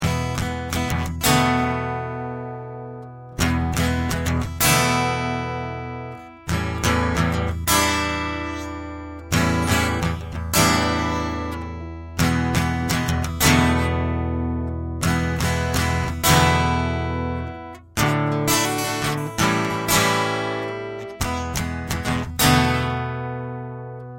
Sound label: guitar loop